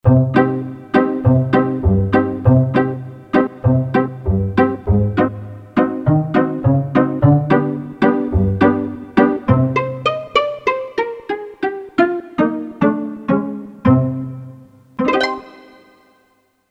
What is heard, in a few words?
Pizz, Korg DSS-1 Strings